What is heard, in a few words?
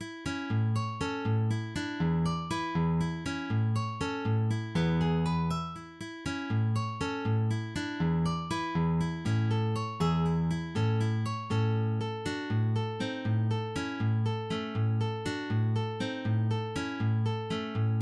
Allegretto, guitar; etude contenant 8 exercices